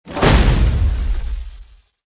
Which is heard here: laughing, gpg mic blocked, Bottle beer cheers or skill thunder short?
skill thunder short